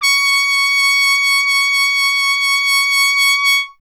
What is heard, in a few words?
Trumpet C#0; Miroslav Vitous - Solo Instruments VOL-1